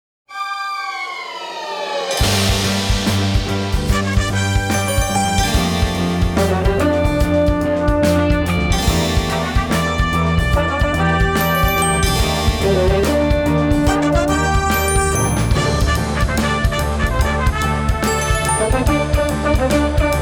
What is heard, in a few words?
Epic Fanfare